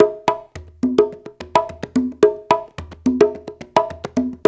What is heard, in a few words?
108 TRI; Spectrasonics; Supreme Beats; African Contemporary